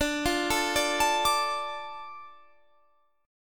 D Minor D, chord

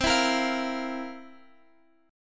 C 11th C down; C11 strummed

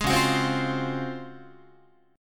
C sharp Major 11th Guitar; C#M11; strummed

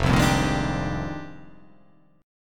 F Minor 13th; Fm13; strummed; JGuitar

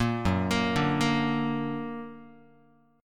F sharp Augmented F sharp Guitar; F#+ arpeggiated; 1,2,1,0,2,x

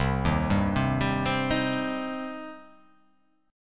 C Minor C down; arpeggiated; JGuitar

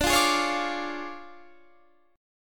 D Minor Major 7th Flat 5th, D, Guitar Standard; 22,20,18,18,18,22; DmM7b5 strummed; JGuitar

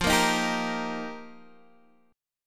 F 7th Suspended 2nd Suspended 4th, Guitar Open D

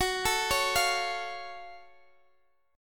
F sharp Minor Major 7th F sharp; arpeggiated; x,x,16,14,14,13

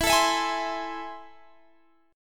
A Minor Major 7th, E

